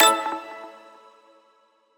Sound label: menu hit; JesusOmega {NM} 『Planets』